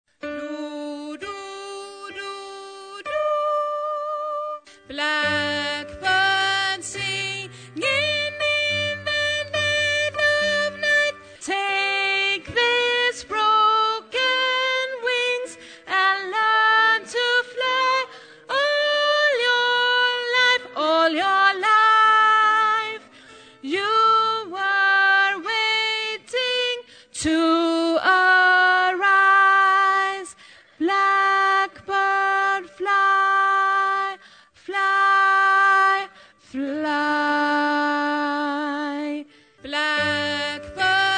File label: tenor